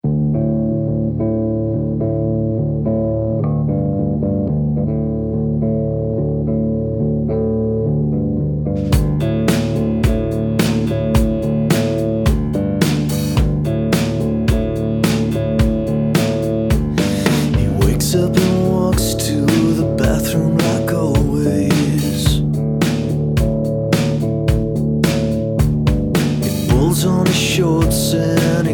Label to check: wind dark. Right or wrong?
Wrong